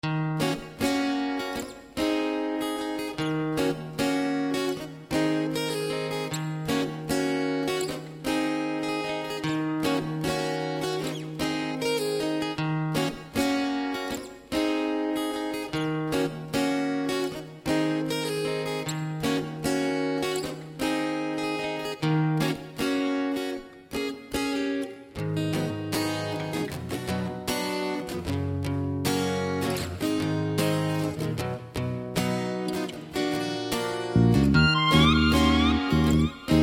(Ebm) No Backing Vocals

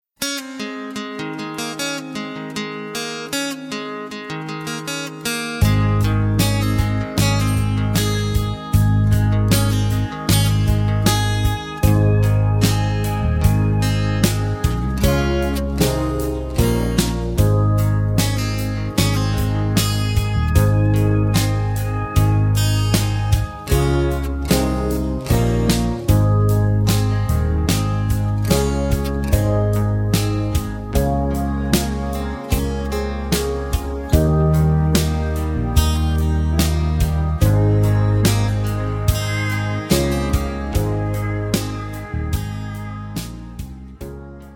(Live) (Ab) No Backing Vocals

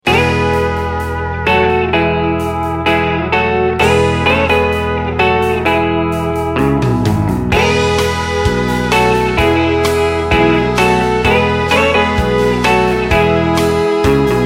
E No Backing Vocals, backing tracks

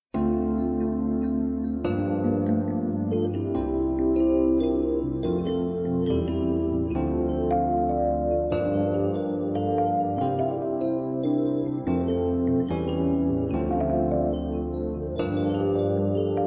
(F) No Backing Vocals

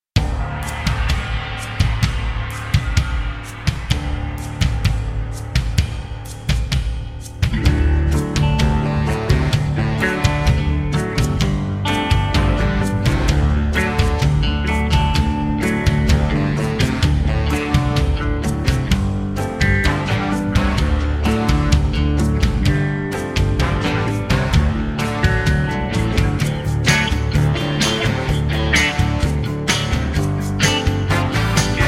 D No Backing Vocals, backing tracks

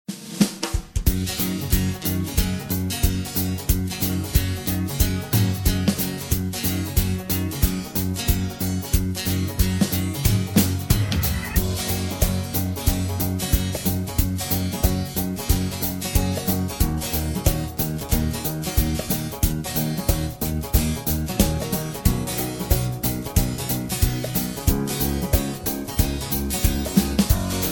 (Gb To Ab) No Backing Vocals

